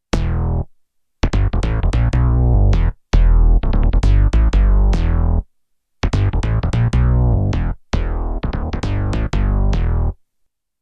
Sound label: yamaha ex